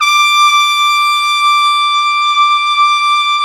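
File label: BRS TPT F, brass trumpet f; Roland L-CDX-03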